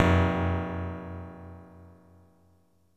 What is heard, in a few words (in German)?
TONLOS, ALIVE INSTR; Soundengine - CSR-000 The Art of Transwaves